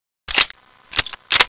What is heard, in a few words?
sti reloadnotempty; svencoop